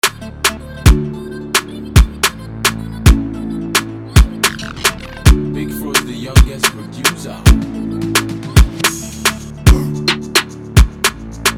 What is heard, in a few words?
Instrumental